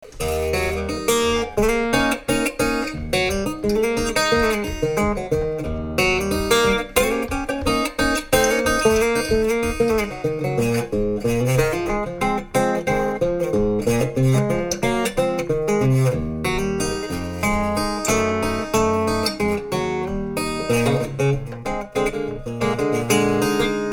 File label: Acoustic; National1